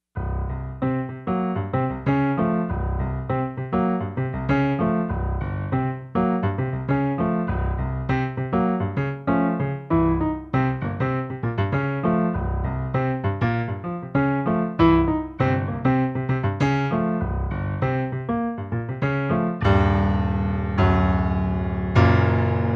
bass blues in c

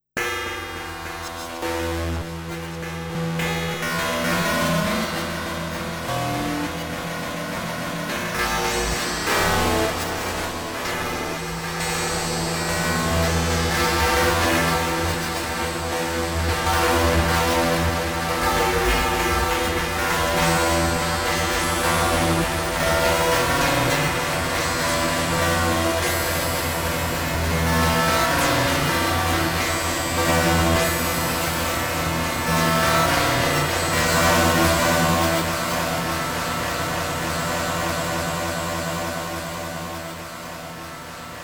powerchords

